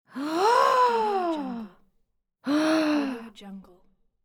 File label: amazed woman